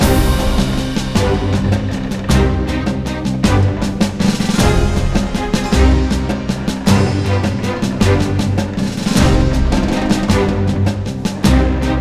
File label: boost Ambience Base; netent